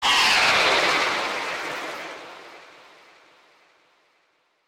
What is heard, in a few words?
Eyeboss Death; Super Mombo Quest